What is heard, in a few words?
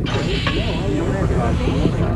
Mess Hall Door; Trek